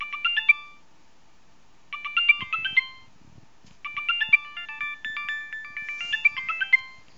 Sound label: nokia 3510i heavenly ringtone